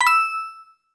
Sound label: Coin sound effect; SM3DW